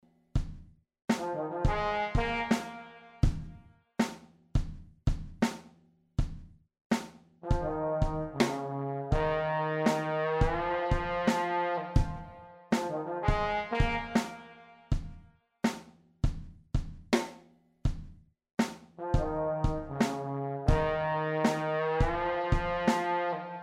trombone

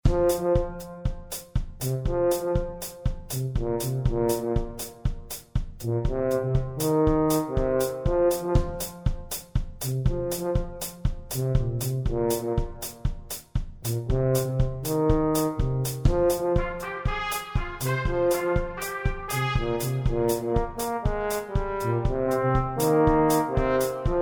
orchestre

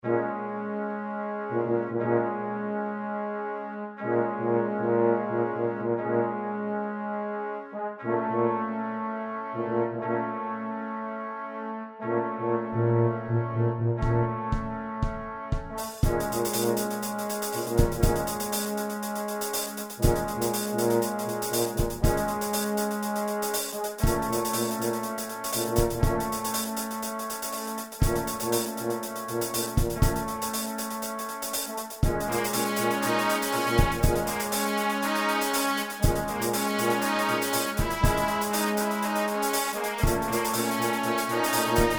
Tuba Trombone Trompette Batterie

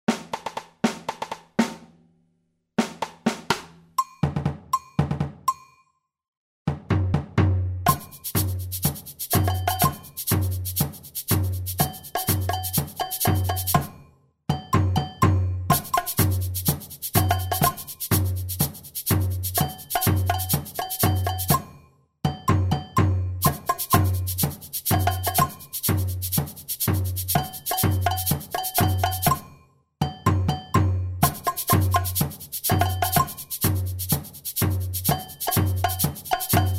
batucada agogo

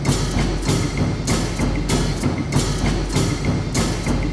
percussions

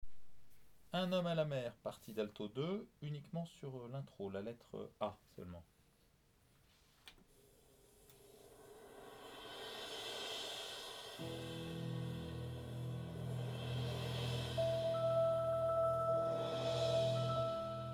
Intro Alto 2; Pinocchio

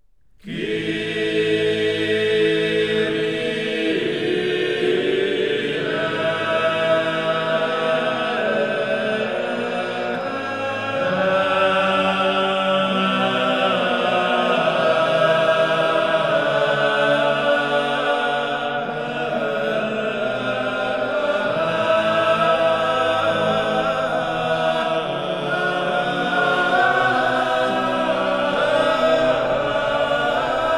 polyphonie

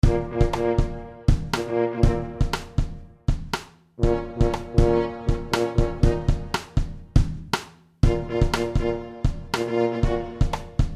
orchestre batucada

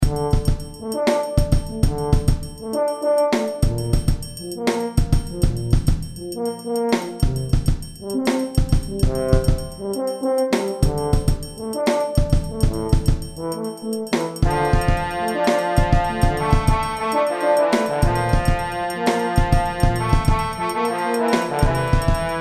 Orchestre

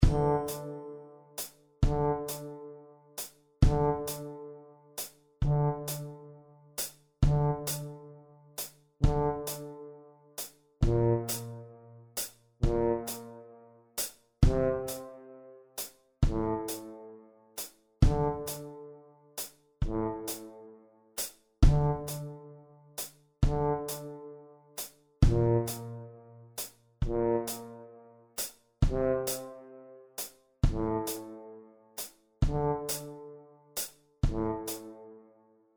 Tuba

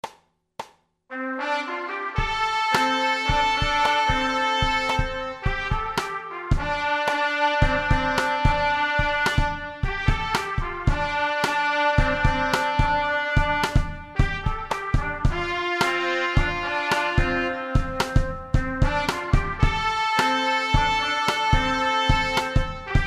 trompette

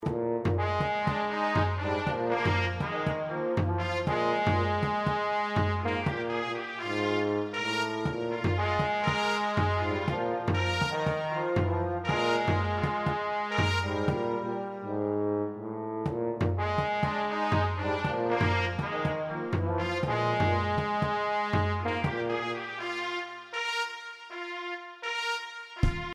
trompette tuba trombone

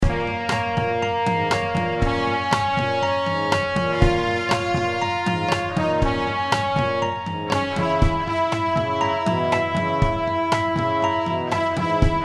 carillon orchestre

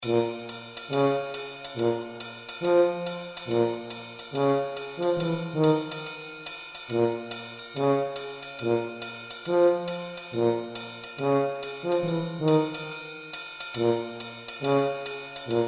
orchestre tuba

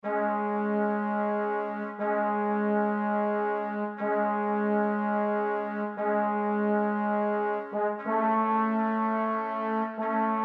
Trombone Trompette Batterie

